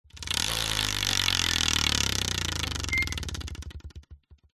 leisure wheel of fortune wooden short spin; zapsplat

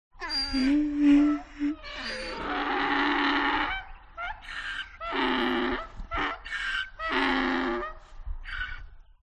DONKEY Bray Resonant; ANIMAL; smartsound

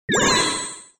Menu Select; little robot sound factory

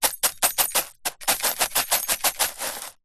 glass broken pieces shake in dustpan brush; foley; zapsplat

